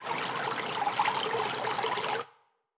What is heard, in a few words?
Water